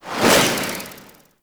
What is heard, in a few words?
Grenade Throw Whoosh; Warframe; Zealoid Prelate